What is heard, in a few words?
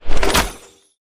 skill bullet; nightingale